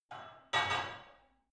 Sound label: barbell only replace unsteady reverby, fitnessstudio; sport freizeit